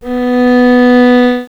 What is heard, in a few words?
vln